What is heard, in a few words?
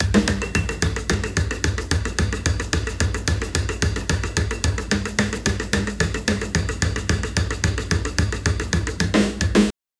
music rhythm picks up, tribal